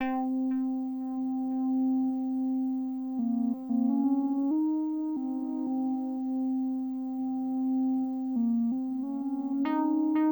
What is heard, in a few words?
SYN, Hip Hop and R&B